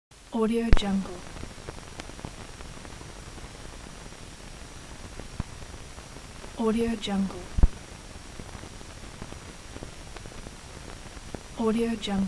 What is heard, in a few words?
film projection end noise